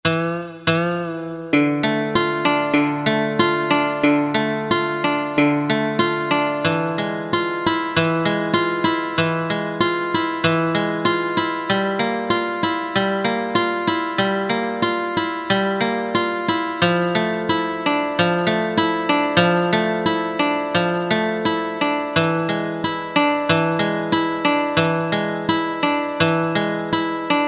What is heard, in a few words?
Acc Gtr; Korg DSS-1; Guitars